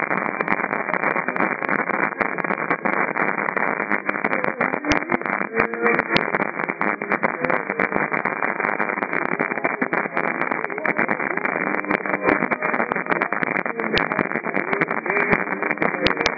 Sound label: 1540kHz